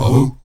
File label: VOX AHOO, Afro Chants, Stacc Ah Oos